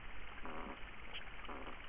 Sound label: groan b, fish groan type b